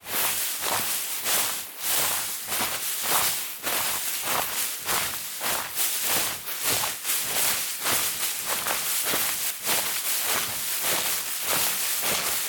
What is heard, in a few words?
walk tussock